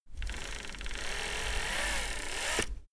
servo move powerful quiet

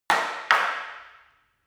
staff wood fighting double

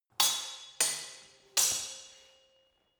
sword hit fast sword triple

